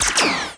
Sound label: Beam Laser; Warzone